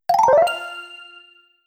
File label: check in successful sound